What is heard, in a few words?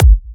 Bassdrum